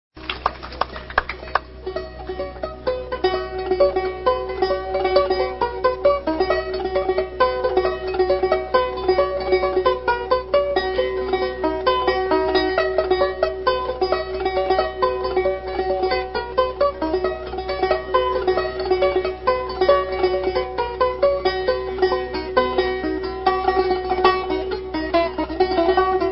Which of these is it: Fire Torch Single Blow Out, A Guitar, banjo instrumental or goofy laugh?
banjo instrumental